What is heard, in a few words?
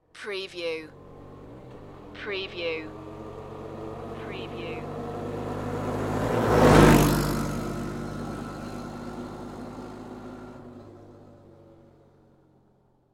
Quad Bike Drive By Left to Right